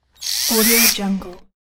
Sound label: household drill